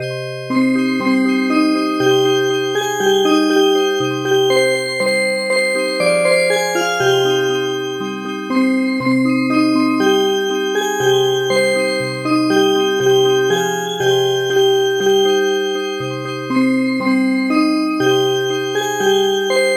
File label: Music Box, traditional; SAND HILL